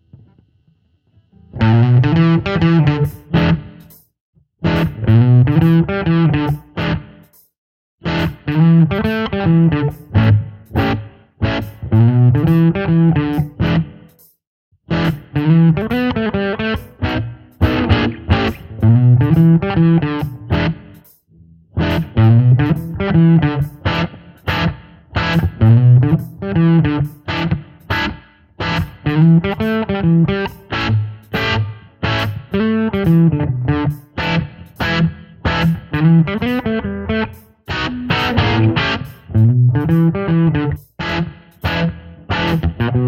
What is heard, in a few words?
Green Blues